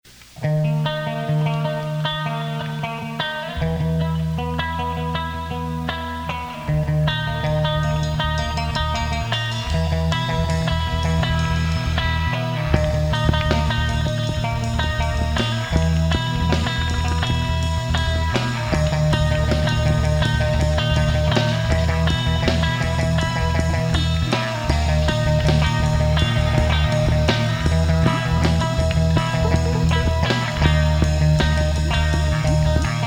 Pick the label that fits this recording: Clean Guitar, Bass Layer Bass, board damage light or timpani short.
Clean Guitar